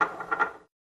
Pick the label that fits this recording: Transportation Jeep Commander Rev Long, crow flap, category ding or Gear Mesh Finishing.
Gear Mesh Finishing